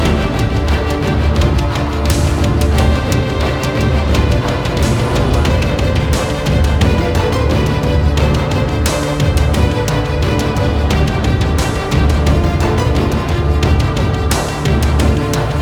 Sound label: Big Action Epic Dramatic Film Score